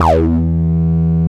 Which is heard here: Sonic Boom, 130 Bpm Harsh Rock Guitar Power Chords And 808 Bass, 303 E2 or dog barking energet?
303 E2